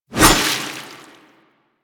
Hover Whizzby Sorrow; Enemies; Warframe; Warframes; Pax Duviricus

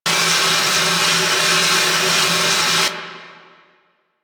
Fire Extinguisher Loop; Weapons; Warframe; RailJack

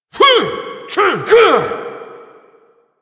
Finisher Vocals; Enemies; Finishers; Drifter; Warframe; Duviri; Dax

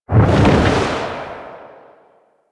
Reaper Sprint Whoosh; Warframe; Wraith

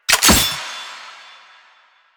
mech charged; Weapons; Sidearm; Warframe; Tenno; Wraith Sidearm; Epitaph Prime

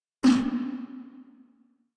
Pain, Grineer Male

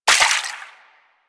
Water Sprayer Surface Impact; Warframe

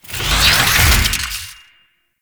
Necramech Ranged Overheat End; Enemies; Warframe

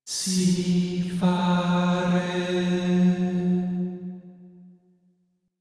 Rand Chant C; Warframe; DE; Hubs; Syndicate Arbiters; Tenno

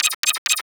Briefcase Launcher Homing Projectile Warning; Warframe; Weapons; Corpus

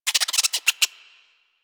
Forest Rodent Vocal Captured Idle; Warframe; Hunting Critters

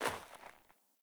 Sandals Land Snow, Footsteps; Characters; Player

